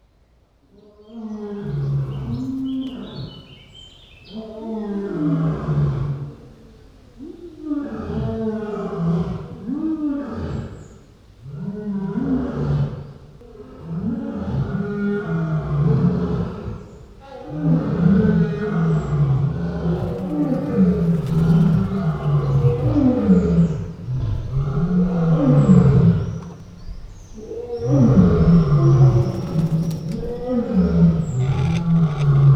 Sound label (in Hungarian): mikrofonnak háttal oroszlán és tigris; allathangok; veszpremizoo 2013